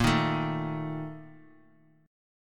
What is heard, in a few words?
Bb Major 7th Suspended 4th, chord; strummed; JGuitar